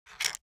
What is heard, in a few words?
object makeup box pick up